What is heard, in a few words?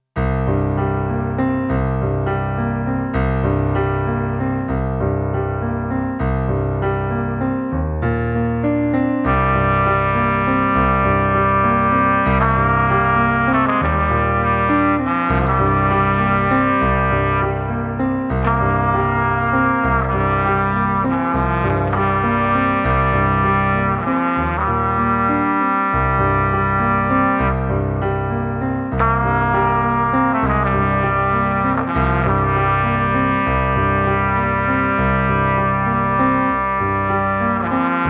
duet for piano and trumpet